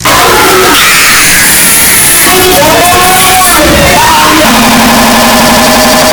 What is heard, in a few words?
computer death scream